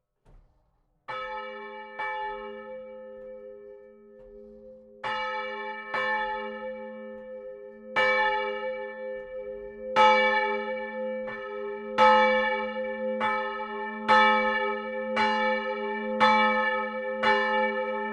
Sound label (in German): glocke; Iven